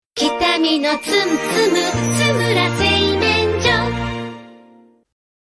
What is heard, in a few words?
song